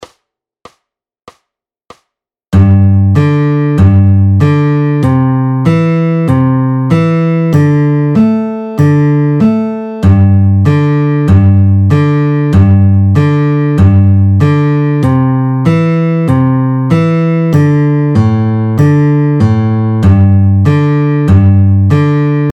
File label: Slow, fingerpicking